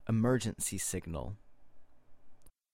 Emergency Signal; transportacion

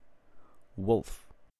wolf

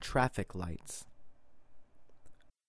Traffic Lights; transportacion

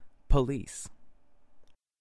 Police; transportacion

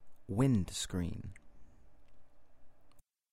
Windscreen; transportacion

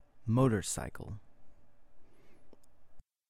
Motorcycle; transportacion

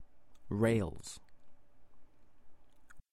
Rails; transportacion

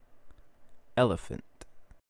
Elephant

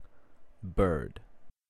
bird

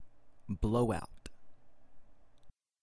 Blowout; transportacion

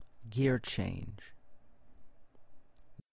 Gear Change; transportacion

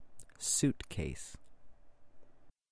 Suitcase; transportacion

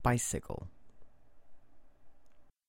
Bicycle; transportacion

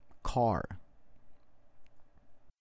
Car; transportacion

